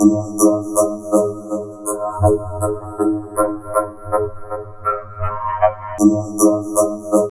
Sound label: VOCOD, Drum Loops Crazy Processed